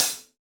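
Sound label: Standard Closed Hi Hat, Roland BK-5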